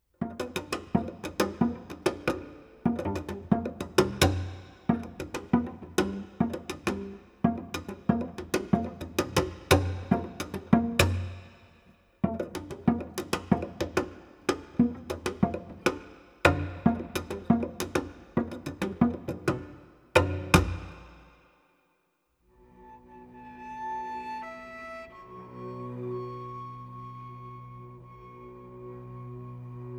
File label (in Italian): Violoncello